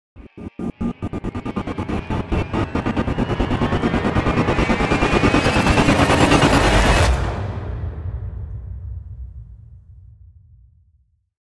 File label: Black Burst Rise Only; Ninja Tracks